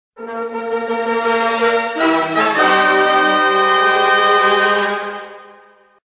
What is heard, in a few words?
Drama Sting; Family Guy Back to the Multiverse